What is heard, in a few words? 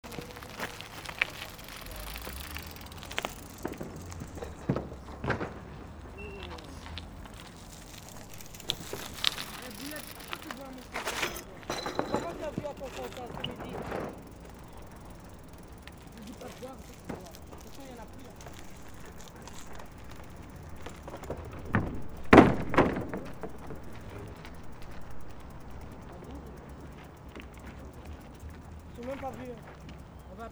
bmx bikers